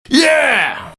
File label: Grenade kill